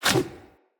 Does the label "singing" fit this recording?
No